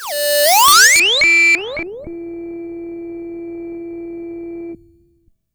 NOISE FX; drums pads; noises

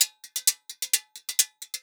Guira 130

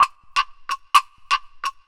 perc 127 scraper; drums pads

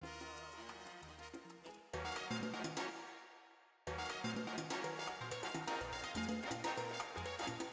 Reverb

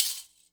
percussion

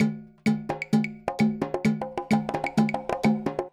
Loop Percussion 130; drums pads

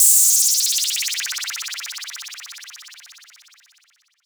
LFO NOISE; drums pads; noises

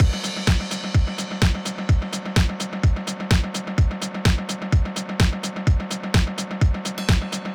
beat

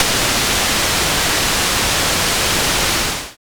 NOISE

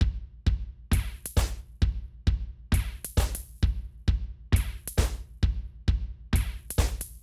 beat 133

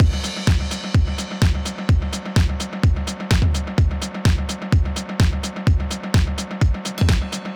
beat

